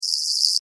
Beetle Chirp, Beetle, Chirp, Vocalization, Insect, Bug